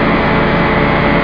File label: air carri; Hostile